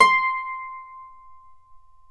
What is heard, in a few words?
Celtic Harp, Plucked Strings